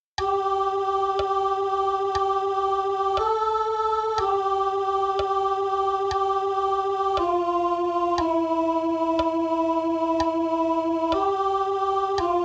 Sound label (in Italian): Come fuoco vivo CONT